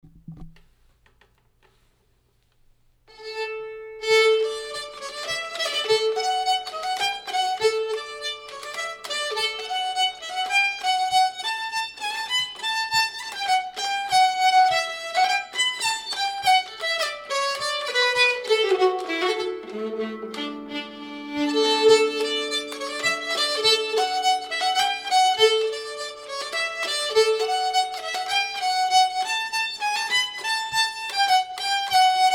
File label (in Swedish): polska; bellmanspolska